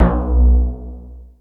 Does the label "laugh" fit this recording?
No